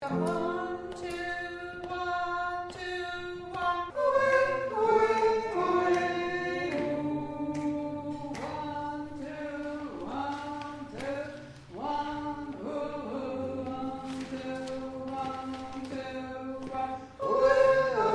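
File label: soprano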